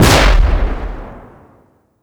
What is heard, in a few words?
helicopter rocket explosion; Rust